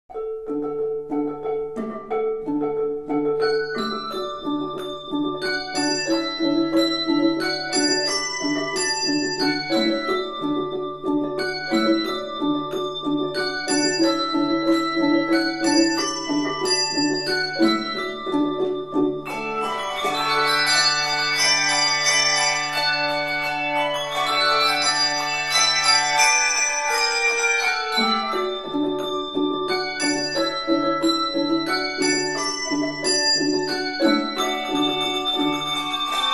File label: concert bell choir come, thou fount of every blessing; bob jones university